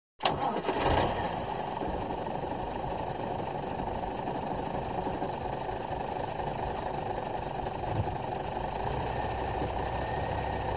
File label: Bus Start Away Coach S